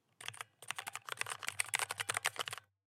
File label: black switch typing